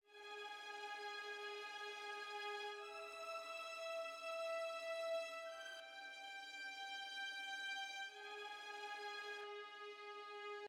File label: music interactive; World of Tanks